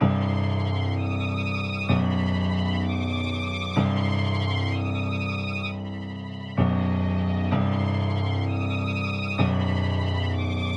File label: Sinister Night 128 bpm C Harmonic Minor, music loops